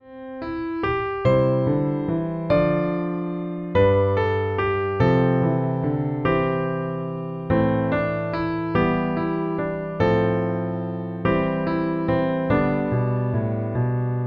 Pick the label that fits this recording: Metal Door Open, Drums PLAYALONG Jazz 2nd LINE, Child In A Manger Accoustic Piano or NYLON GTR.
Child In A Manger Accoustic Piano